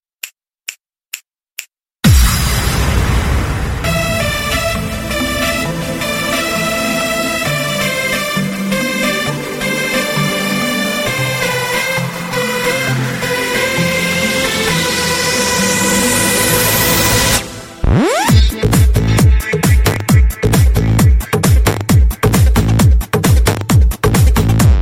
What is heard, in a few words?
rocking